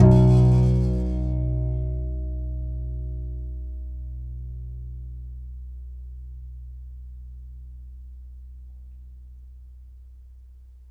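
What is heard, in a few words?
contrabass balalaika, Plucked Strings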